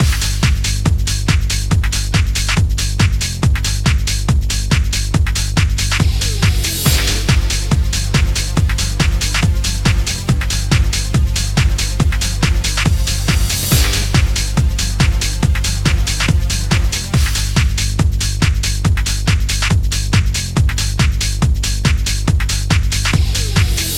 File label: BGM name entry